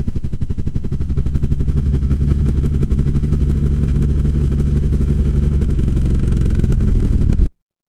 mechanical engine

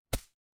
impact gunshot

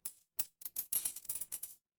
feedback celebration

